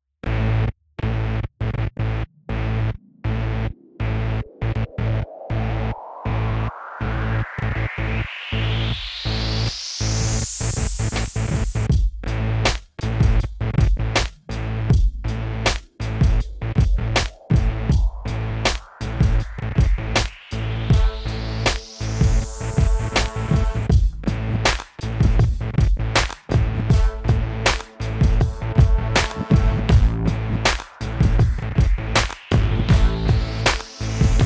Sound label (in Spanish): Base musical RAP